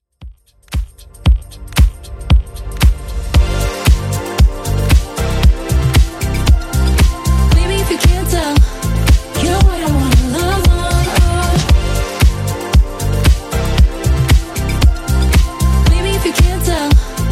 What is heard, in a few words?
Top40 DJ Friendly Redrum 115bpm